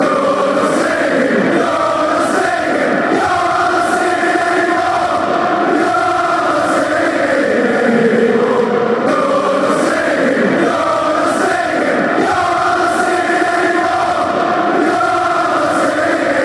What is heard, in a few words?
you're not singing anymore, fan chants